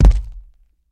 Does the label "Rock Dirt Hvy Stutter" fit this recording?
Yes